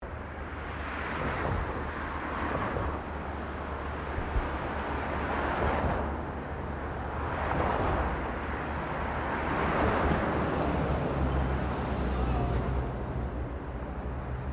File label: Traffic Cars General Pass By; General traffic sounds; Traffic and Cars